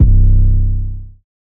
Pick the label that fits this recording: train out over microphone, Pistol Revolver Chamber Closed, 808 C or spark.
808 C